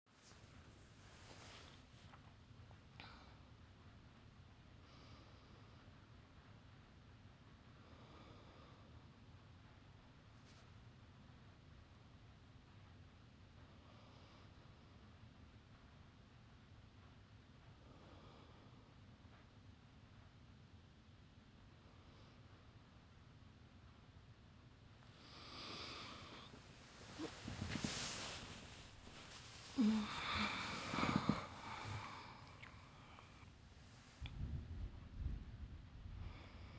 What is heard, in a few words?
in my bed, Rain, Breathing, Kisses, Whispers; [F4A]18 minutes in my bed